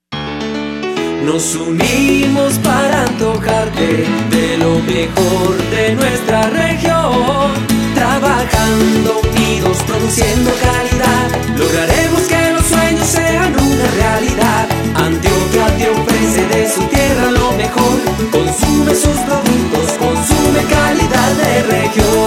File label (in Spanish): Antojate de Antioquia Jingle